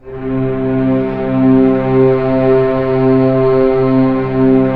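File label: strings C2 PP L; Sound On Sound - Peter Siedleczek's Orchestra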